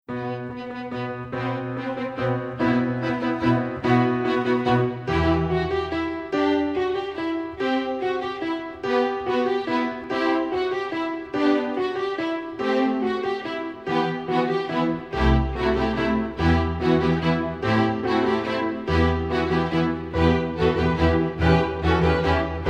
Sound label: ring christmas bells; wingert jones music